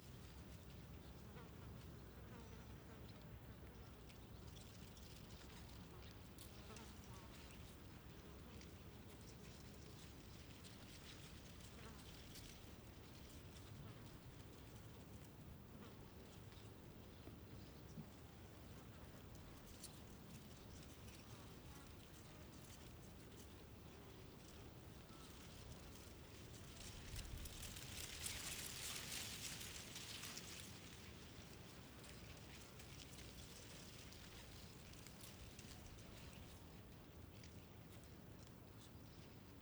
Settlement General Ambience

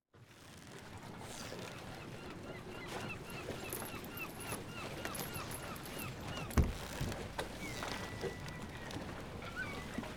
Docks Ambience Calm

